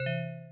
ding

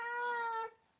baby cry crying

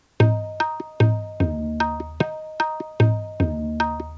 Jhaptal Sample, Tabla; Tabla-Transcriber

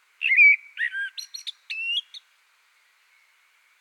bird audio

